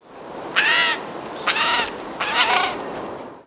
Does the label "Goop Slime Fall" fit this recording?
No